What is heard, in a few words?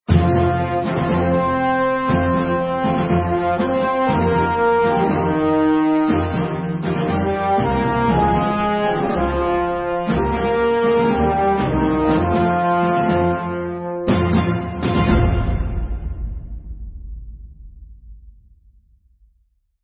success; nuts game phaser